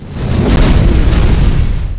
폭염룡

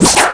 내려찍기

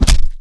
벽튕김

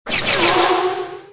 드래곤테일

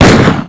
hit 라이플

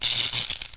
일렉트릭워크

